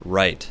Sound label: Music Right; Counter-Strike Global Offensive; PS3 Windows Xbox 360